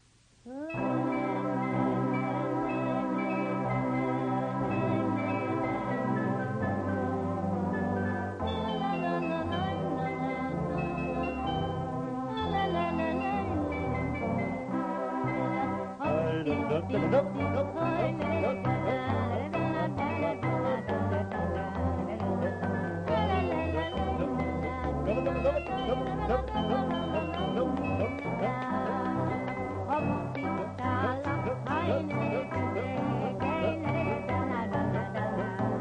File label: gypsy+organ and hard objects bad mix; Prophet